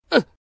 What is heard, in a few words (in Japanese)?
dame jaku, ダメージ弱, ナルシストタイプ02; ナルシストなモテ男タイプ、帰国子女的な台詞も混ぜてみたり。; 上記と同コンセプト、ややクセの強い演技に。; ゲーム用フリー音声素材集; 空中戦キャラとか、妙な動きができるといいかなぁ。